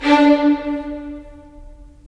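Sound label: strings violins; Roland - LA Composer vol. 4; Violins Sh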